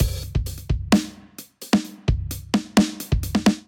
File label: Kit groove 130 bpm; MIDI Clips; 111 - 130 bpm; Arizona Kit groove; Ableton; Factory Packs; Drum Essentials